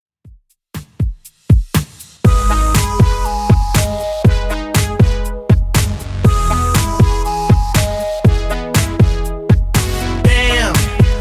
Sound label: 2ks Redrum 120bpm